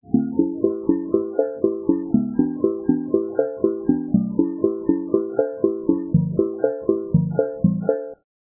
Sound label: drum